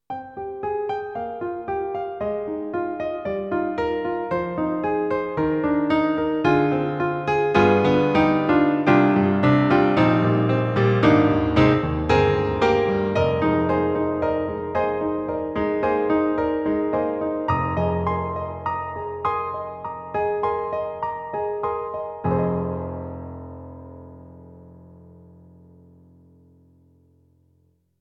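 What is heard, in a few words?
Hall Grand, piano; Roland; XV-5050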